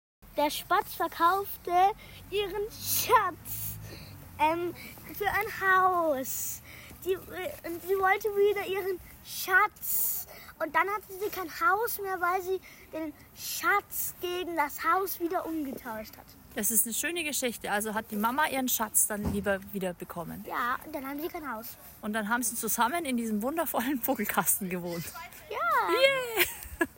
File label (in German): Spatz, Sperling; Ruf und Gesang eines Haus-Sperlings